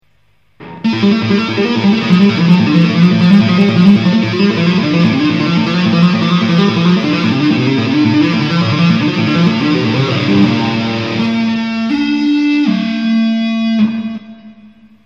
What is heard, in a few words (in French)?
exo tapping a vide